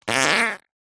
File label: cartoon fart sudden rasp